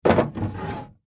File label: Foley Desk Drawer Open; Drawer Sound Effects; Drawers (Sound Effects:Household); SFX Bible 6